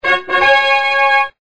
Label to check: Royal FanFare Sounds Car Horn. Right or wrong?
Right